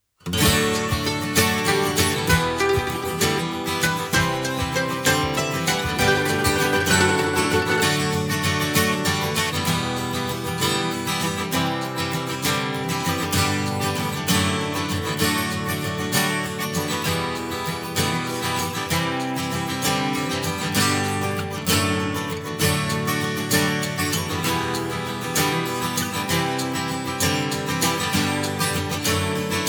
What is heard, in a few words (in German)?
Lagerfeuerversion Karaoke